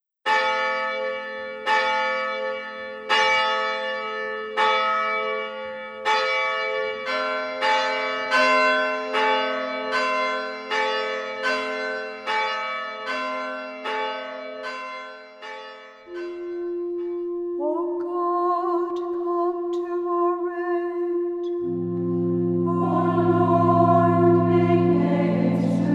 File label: Bells Opening Verse